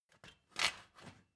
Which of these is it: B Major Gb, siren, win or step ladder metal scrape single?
step ladder metal scrape single